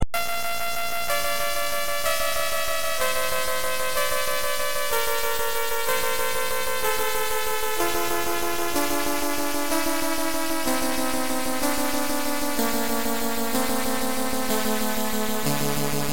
C64 Music; Parallax

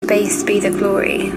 bass

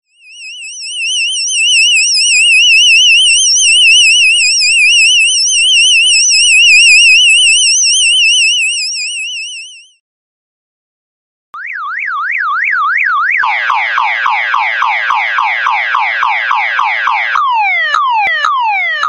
Htc Alert Clock

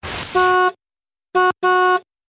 Car Horn

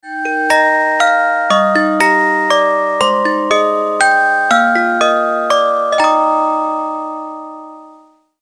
Phone melody

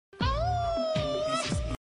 Howl; Rains

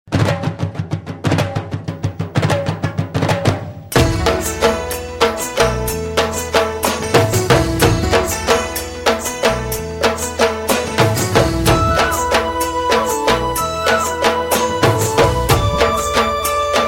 Garba Dhol

